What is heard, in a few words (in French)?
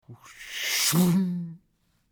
tranche papier; Machine